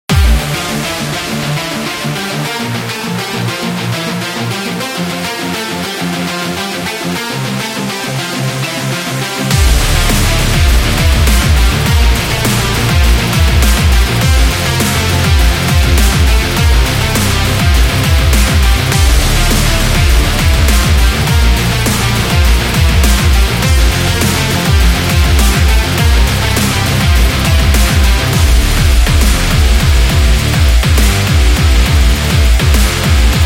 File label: An Action